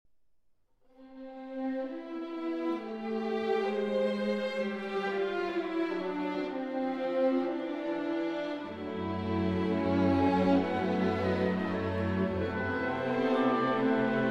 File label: For Trumpet and Orchestra; Arr. Allhoff